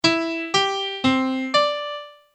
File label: sonification